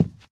cardboard, footsteps walk

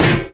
physics turret impact md

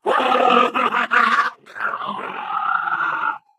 ballbot crushed scream

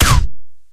sniper rifle, weapons; tf

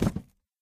phys cube, physics

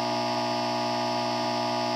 power transformer loop, ambient machines; Source Engine; cstrike

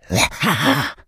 become alert, infected

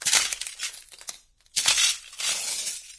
footsteps glass; ambient; Source Engine; video game

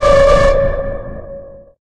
perimeter alarm, ambient alarms; Left 4 Dead 2; Source Engine

